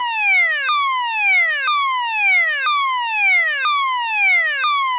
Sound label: tone descending; rockwell automation; visual signaling devices; 855 control tower stack lights